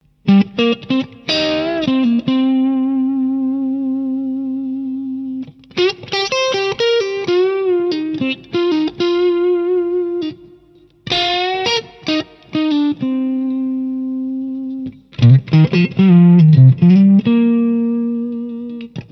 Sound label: Neck Mid, guitar pickups; Platinum